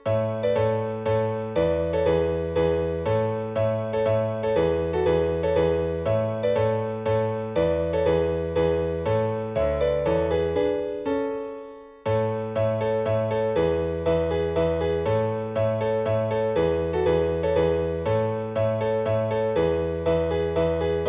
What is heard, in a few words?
Minuet; Princess Royals Minuet